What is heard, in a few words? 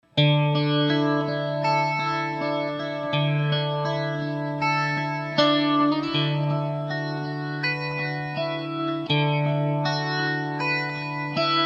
Boss RT-20 setting 1, RT-20 Mode 1. Leslie speaker sim.; Stereo setup with two amps.; Boss RT-20 Sound Clip 1 - RT-20 Mode 1. Leslie speaker sim.